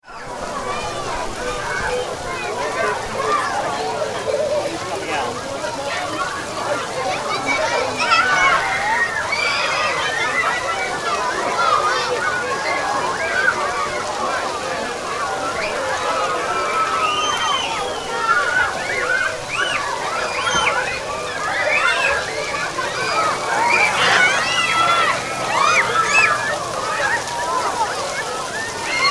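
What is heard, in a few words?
Theme Park Atmosphere - Various activity background; Tom Hutchings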